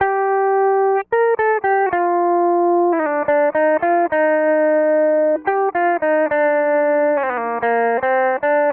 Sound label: Lead, Synth, Leads; Sonic Foundry (Sony Creative Software); Electrocution